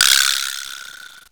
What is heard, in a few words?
prc, percussion mix; house; PS-28 Vocal House Samples; Drum & Perc